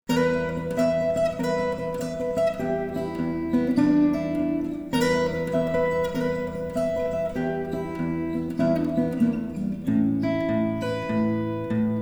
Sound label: blues